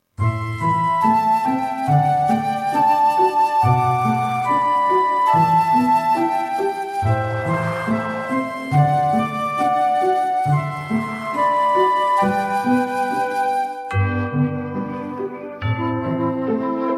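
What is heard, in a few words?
backing track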